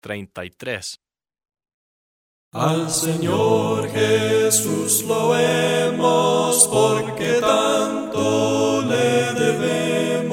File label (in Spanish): Palabras cantadas sin instrumentos; Al Senor Jesus loemos